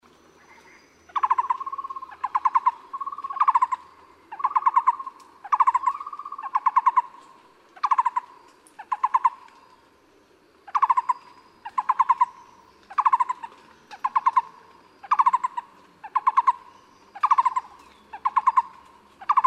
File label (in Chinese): 五色鳥; 灣擬啄木; 鬚鴷科/留鳥